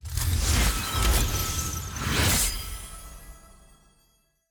honor level up

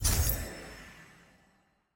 ui mythic button hover

